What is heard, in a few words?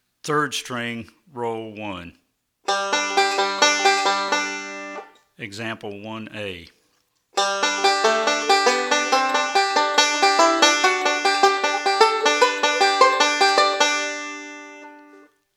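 Rolls For Melody On 3rd String, banjo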